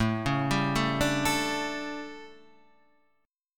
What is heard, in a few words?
A 11th, guitar chordshape; A11 Chord {5 4 5 2 3 5} arpeggiated